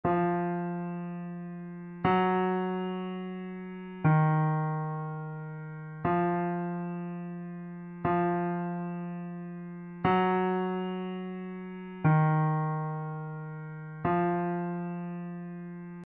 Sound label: test steinway